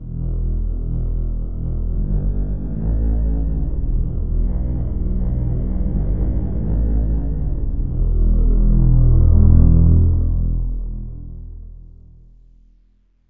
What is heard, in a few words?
sweep pad bass